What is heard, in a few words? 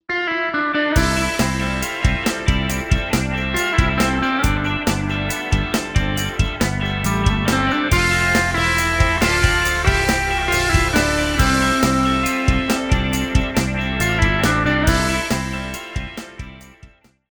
music sample country